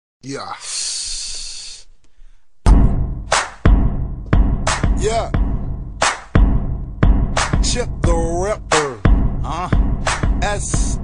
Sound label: interior song; gmod; paris